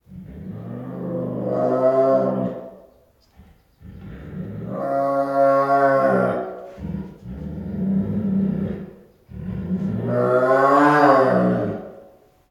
Female Bengal Tiger at Zoo, with Growls Build Up and Snarls; Tigers; Sound Ideas